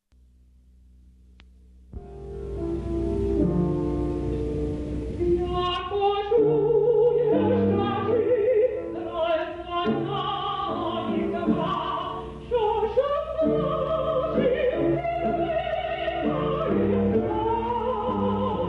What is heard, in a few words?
song; music Denys Sichynskyi; poetry Ivan Franko